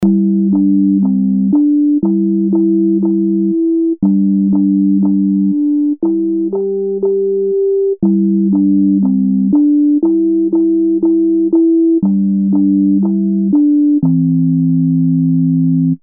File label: MIDI; Mary